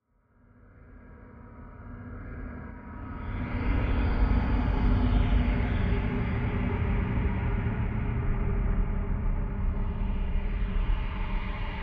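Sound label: Ambient Pulse Loop; Silent Hill Homecoming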